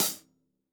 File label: hihat - closed side; JazzFunkKit